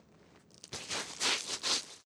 Foot Scratch Ground

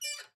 Metal Squeak; Lethal Company

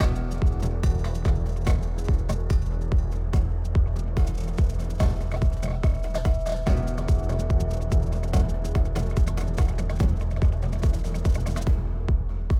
Music Gameplay State Tension; Music Hideout2; H2 New Level Area=Balcony 2

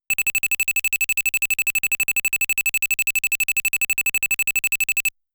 Fuel Bonus Sound

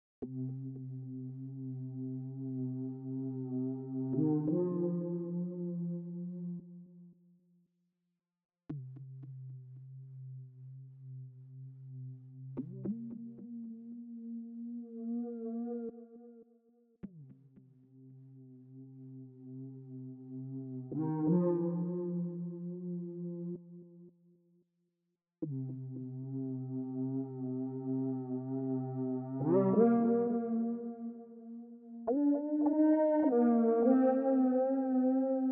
Leads

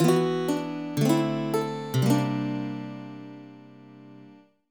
Jingle Fanfare; English Training; Have Fun Improving Your Skills; DS

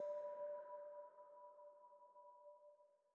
Citadel Bells; Frozen Flame; windows

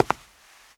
walk concrete

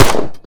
VECTOR CR21

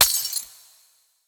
Explosion Ice Glass; Boomerang Fu

